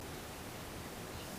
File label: room empty